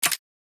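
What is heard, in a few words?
shells eject; weapons; dbarrel; TOZ; svencoop; hunger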